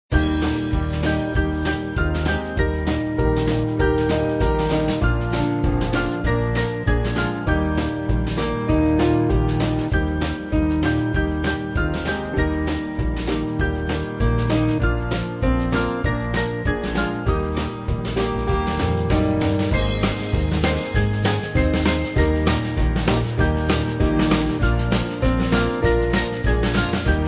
band, Music Backing Files for Churches; Constancy; 4/Eb-E

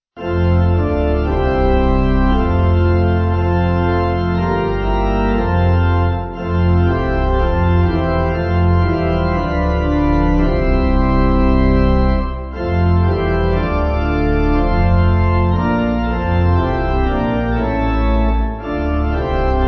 Pipe Organ, Music Backing Files for Churches; For The Bread; Omni Die; Omni Die 8.7.8.7

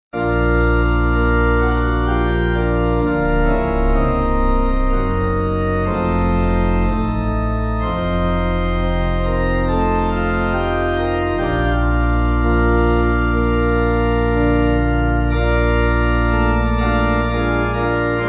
Pipe

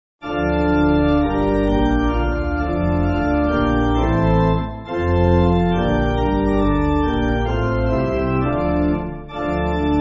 Pipe Organ, Music Backing Files for Churches; Holy Spirit Fill Our Hearts; Debrecen

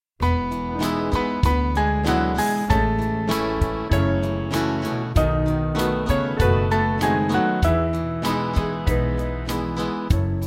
Band

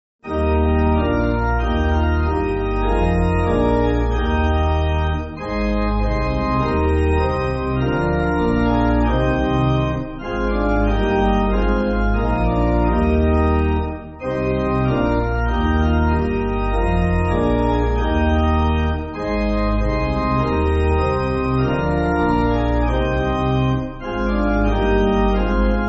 Pipe Organ, Music Backing Files for Churches; There Came A Voice; C.F. Witt

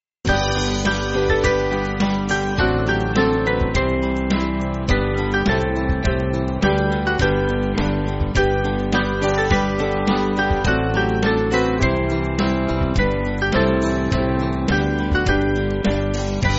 Band

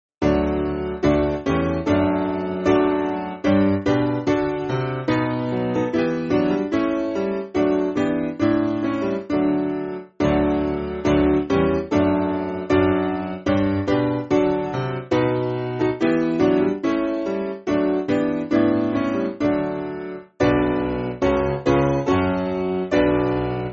Piano; Sing Praise To God; Mit Freuden Zart

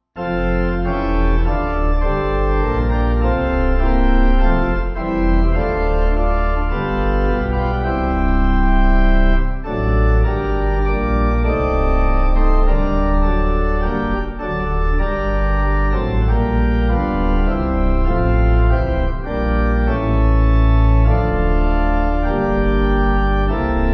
Pipe

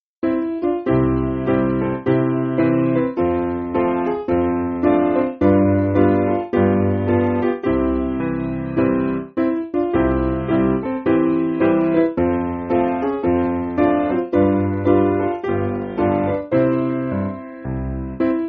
Piano

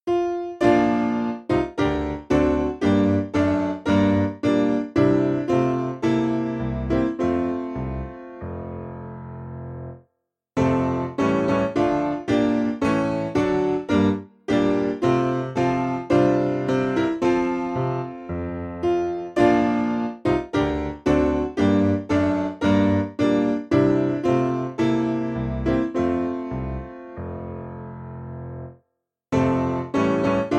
Lamp Of Our Feet, Nox Praecessit, Piano

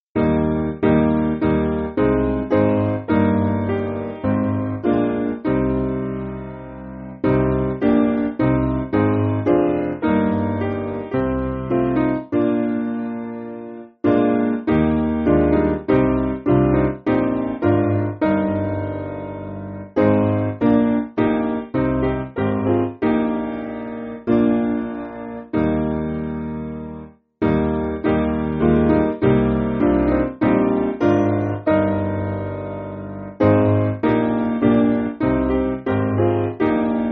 Sweet Saviour Lodsworth SPiano; 6/Eb

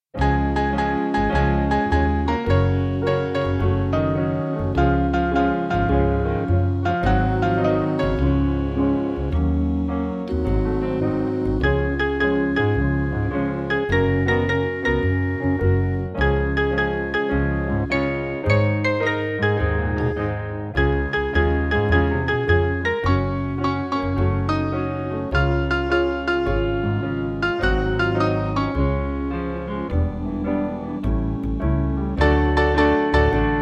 Band

